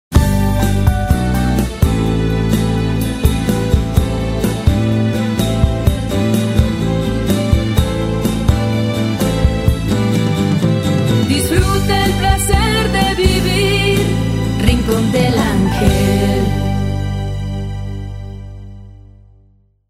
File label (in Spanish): Jingle; Rincon del Angel; Blanchet